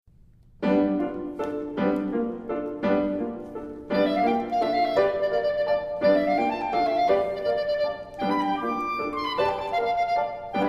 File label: Trio; Halcyon Trio; Gordon Jacob; Trio iv